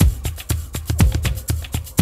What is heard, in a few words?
Loop, Roland; Sound & Vision - Gigapack I